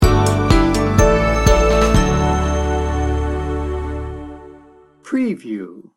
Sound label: coming home sting bumper